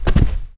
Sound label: Rug Jump